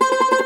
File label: cumbus saz C, plucked strings; E-MU Producer Series Vol. 7 – Old World Instruments